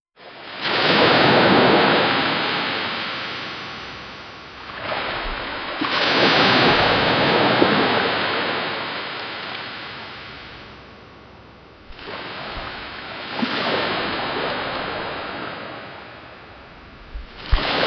a sea wave splashing that, lo-fi

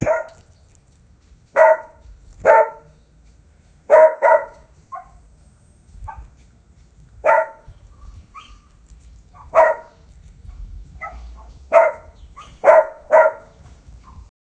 the dog is barking

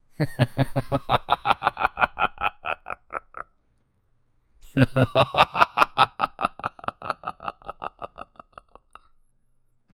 villain laughing male

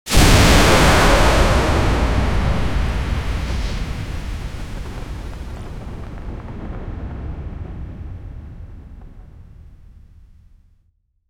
fiery explosion and blood, chaos

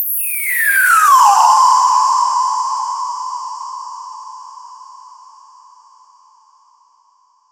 short crisp, retail

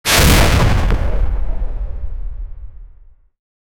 a war shell falling direc; battlefield

